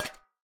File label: step, coppergolem; Minecraft